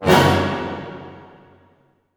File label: F# MAJ FF, orchestra hits; Sound On Sound - Peter Siedleczek's Orchestra